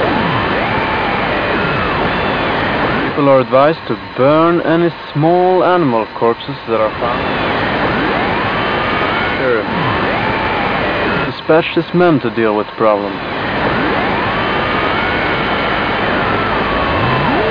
radio; HLhunger

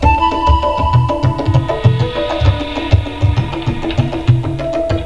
Safari Intro; Safari 2.4.2

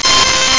jetplane; Epic Collection 3, The; Epic Marketing; muzak

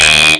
virusense warning

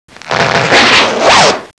zipper down; Sound Effects for Multimedia - Volume 1

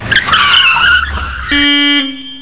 wheels

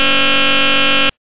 BEEP BUZZ

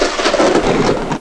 low thunder, nature; Sound Effects for Multimedia - Volume 1

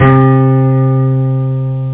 elf piano

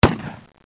12 gauge, guns; KABOOM! - Wild and Wacky Sound Software

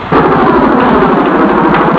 twirler, instruments various

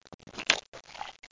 splut; alienbreed3d2

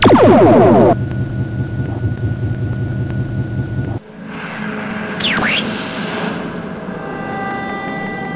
space

